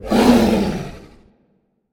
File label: Idle Roar; smite; raijin